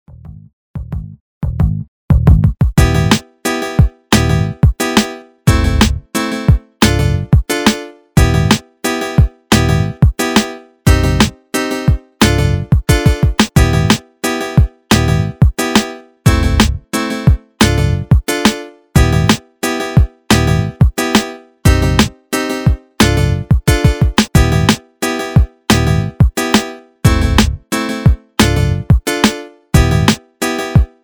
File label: beat